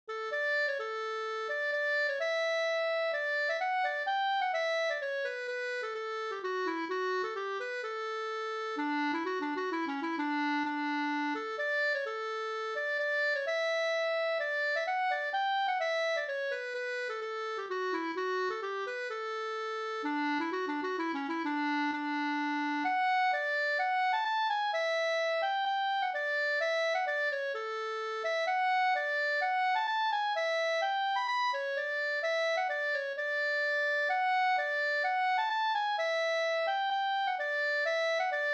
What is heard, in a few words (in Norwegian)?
pols Rorospols; Skott-Saraleken no JC